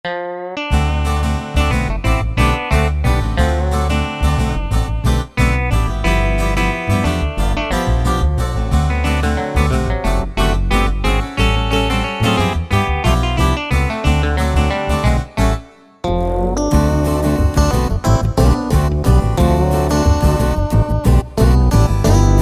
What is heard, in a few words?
Ensemble, Yamaha PSR-3000; Factory Demos; MEGA VOICE Technology